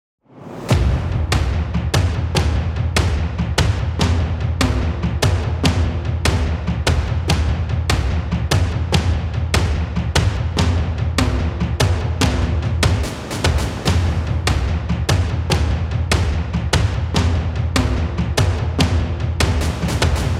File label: Obscured by Light Underscore, Hard Hitting; Narrative Mind